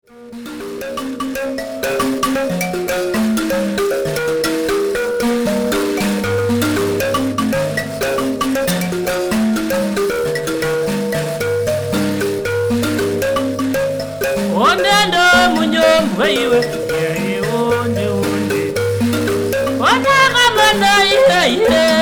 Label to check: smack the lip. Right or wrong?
Wrong